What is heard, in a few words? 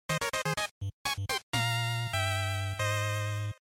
GB Action Game Over